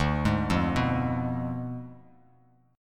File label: D Diminished 7th D down Bass Standard; arpeggiated